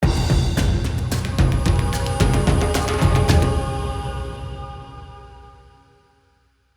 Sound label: Stinger Encounter Complete, Music; Stingers; Encounters